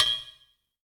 clank

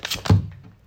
place down, cards; aliens

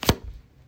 place down, cards; aliens

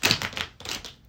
shuffle, cards; aliens

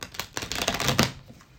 shuffle, cards; aliens

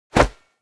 swish

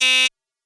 apartment buzzer bell press; mixkit

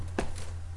one step sound

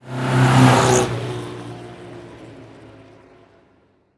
zoom

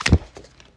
throw down, cards; aliens

